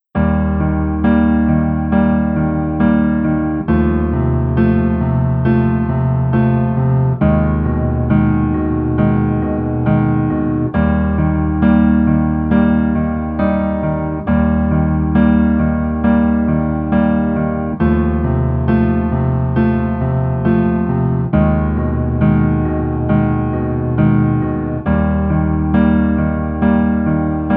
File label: Piano